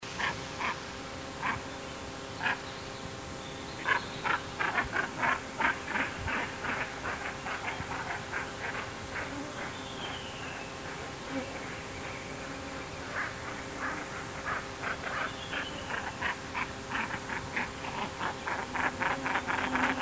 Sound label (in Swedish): Smaskrake